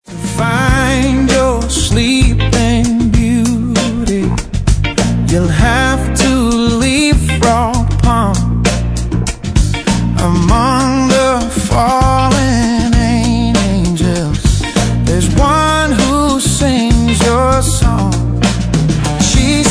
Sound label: R&B Blues